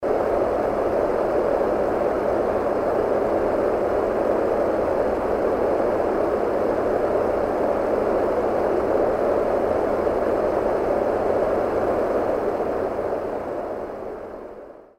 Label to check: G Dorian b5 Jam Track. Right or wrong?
Wrong